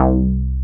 DANCE BASS; AKAI S6000 CD-ROM - Ultimate

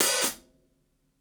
ROOMY OPEN HH, HI HATS; Basement drums vol 2

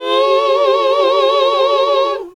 HAL GOSP., GOSP. MMMhh

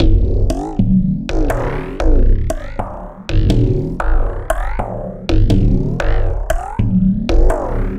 Frame Drum Loop, Ethnic Instruments

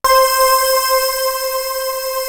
slider, synth; East West; Global Sounds

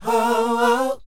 SHOO B C, R&B Dance, vocal; Spectrasonics Vocal Planet

